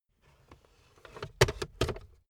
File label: car backseat table pull up slow